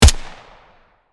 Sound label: M4 assault rifle single shot; gfxsounds